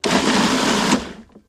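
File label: Food Processor Pulse, appliances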